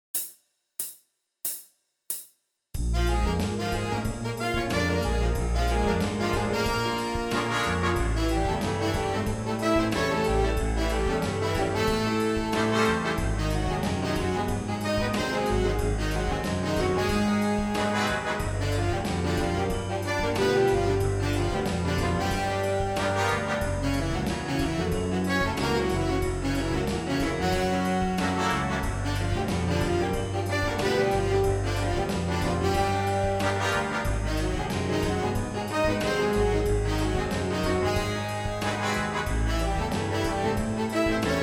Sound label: Lip Slur